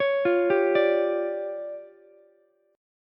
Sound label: D Minor Major 7th Double Flat 5th, D, Ukulele; DmM7bb5; arpeggiated; JGuitar